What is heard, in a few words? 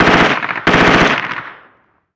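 gun mp5 medium; ModernWarfare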